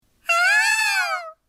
インドクジャクの声

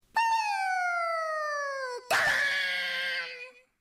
打ち上げ花火

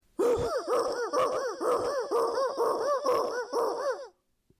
のこぎりで切る

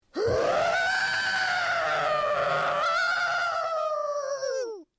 風が吹く

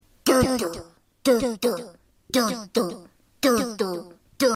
ドックンドックン

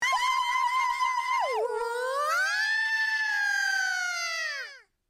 パトカーのサイレン

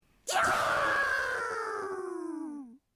爆発; 声マネキング; 声マネキング対決; フブみこさん; ホロライブ; さくらみこ

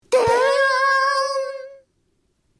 不安

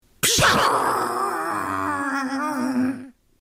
爆発

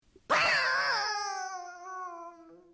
鉄板を叩く

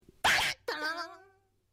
薪を割る

